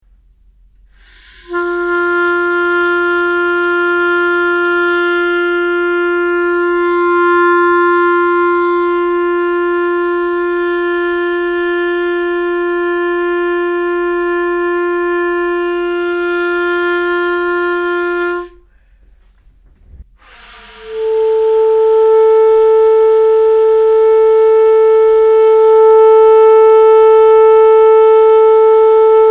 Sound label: long tones, clarinet